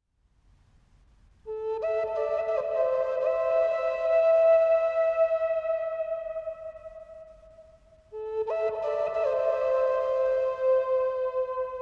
Peaceful Reiki Rain Storm, Asian Zen Spa Music Meditation